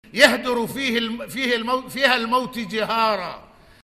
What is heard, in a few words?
loop